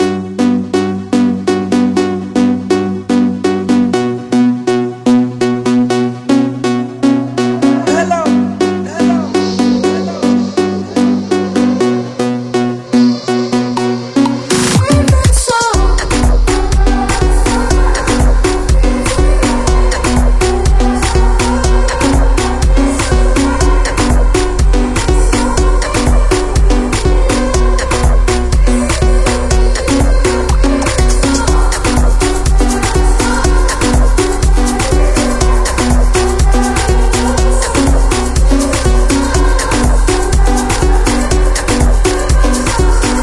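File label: 122bpm B minor, beats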